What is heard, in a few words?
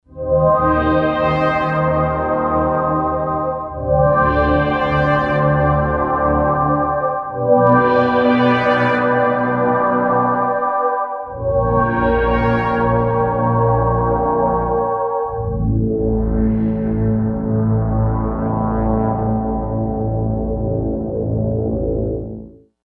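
Slow Brass Chorus; Digital Synthesizer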